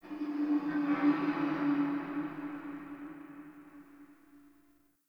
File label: Under City Alley Eerie Metal